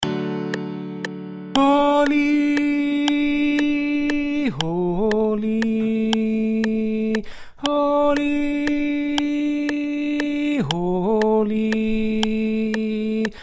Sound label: tenor; music of heaven